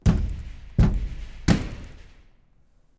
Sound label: Doorknock Hard